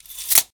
Tape Measure Close; Tools; 1001 Sound Effects

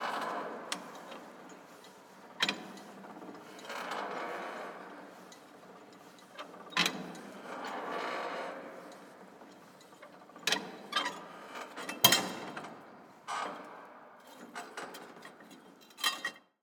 Semi Truck; Blastwave

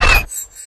Humanoid Ranged Magical; Kilta

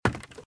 Footsteps - Wood - Creaky - Run - Left

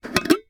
Specimen Jar Open Lid; Medical; Blastwave

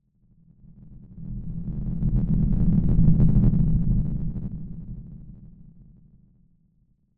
Whoosh Gritty Tone Hoarse Pass - Low Buzzing; Hollywood Edge; sound designer's toolkit v2

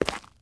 zombie footstep concrete; DeadFright

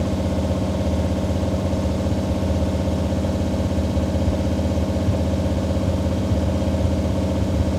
Lamborghini Idle Loop, Automobiles

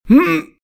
hurt; RadwinIsland

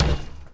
Street Ball Glass Back

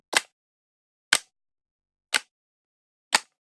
Generic Button Plastic Small

